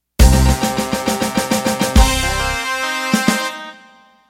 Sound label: Shuffle Intro